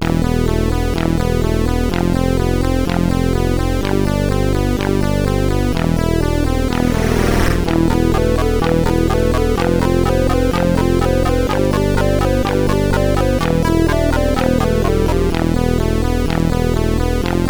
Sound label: Bells, CSG8580R5